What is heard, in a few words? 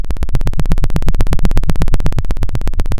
Sound of a helicopter